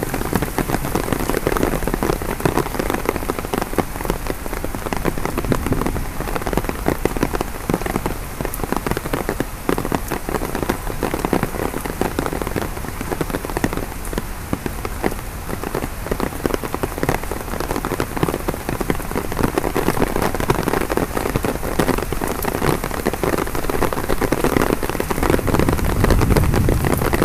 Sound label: outdoor